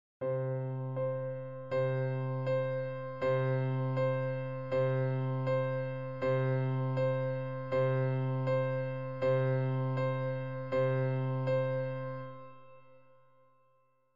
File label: Changing Time Signatures